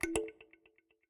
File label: Kalimba, notifications; Yota Yotaphone-2